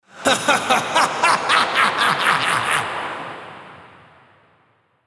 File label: laugh